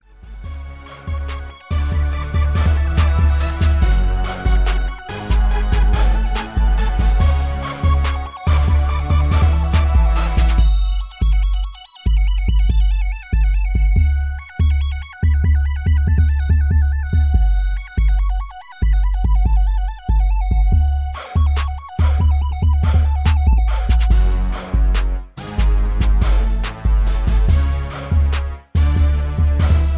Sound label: 71BPM